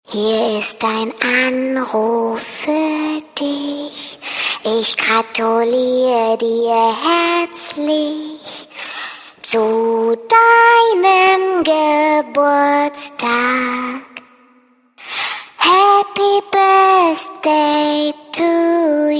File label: HappyBirthday, ringtones fun fx; ghia productions